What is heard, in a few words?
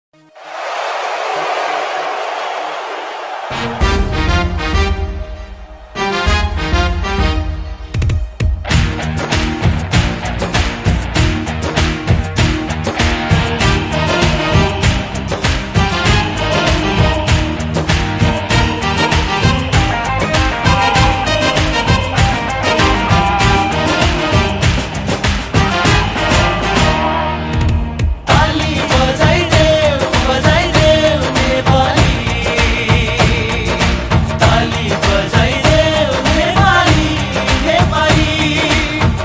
nepali cricket song